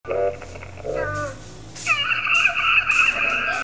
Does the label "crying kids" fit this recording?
Yes